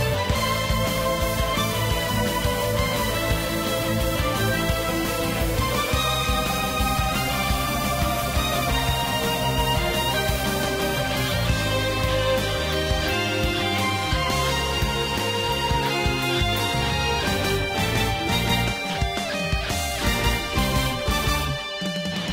bgm battle; Granblue Fantasy; android

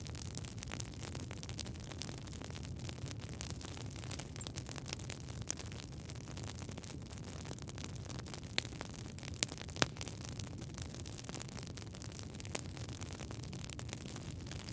campfire loop; teardown ps5 windows xbox series xs gamerip 2022

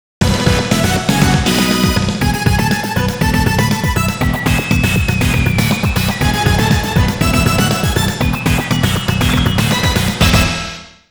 Win; Digimon World

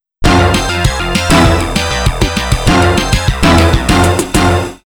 Stage Clear; SNK Arcade Sound Digital Collection Vol. 01

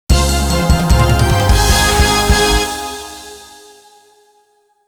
Mission Clear; Rockman Sound Box 2

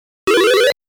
Eating The Super Power Pellet; super pac man

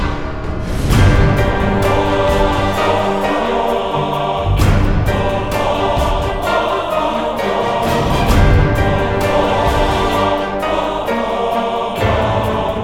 Battle Epic; Vendetta Curse of Raven's Cry

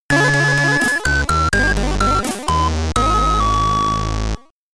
Game Over, SID Stereo, commodore 64; Black It

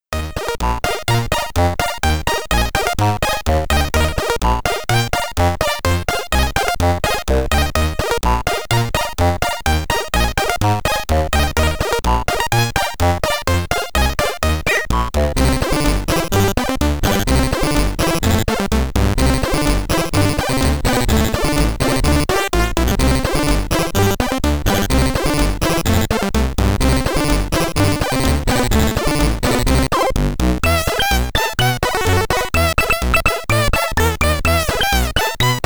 Stage BGM, SID Stereo; Guldkorn Ekspressen; commodore 64

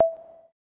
Sine Pluck F1; blek; android; wii u

